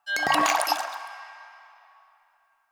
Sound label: Vial Foley; Warframe; Lasrian; Lasrian Soldiers; Footsteps; Lasrian Goonadier